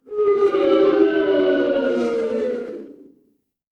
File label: crowd boo